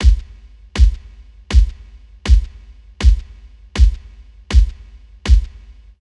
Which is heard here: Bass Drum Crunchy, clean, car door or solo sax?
Bass Drum Crunchy